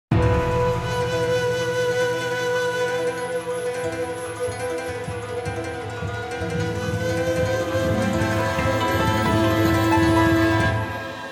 Falling Stinger; B Path of the Teal Lotus; Ice Caverns

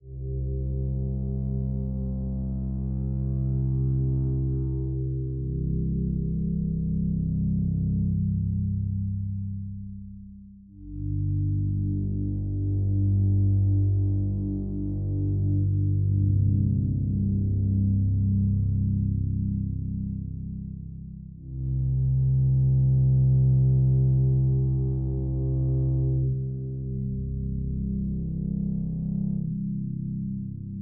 drone; Space Wreck

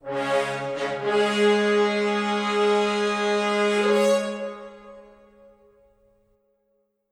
Music Fanfare; Fable Anniversary

